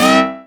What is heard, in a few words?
Synths Root C